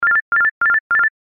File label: bounce